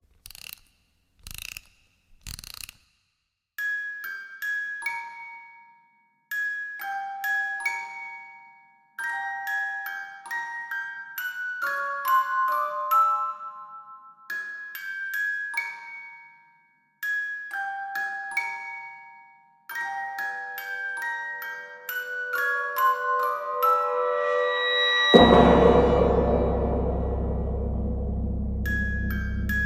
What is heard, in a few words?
scary music